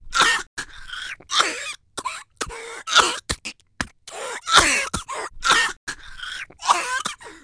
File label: COFF, COUGH; Messiah